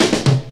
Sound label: short 114, drum; BREAKS